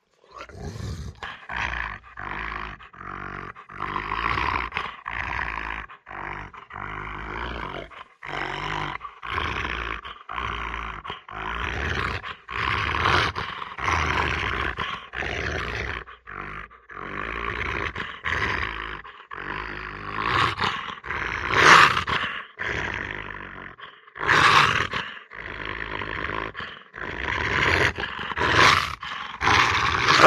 Dog, Rottweiler Growls. Wicked Short, Rapid Growls With Snap At Tail. Close Perspective.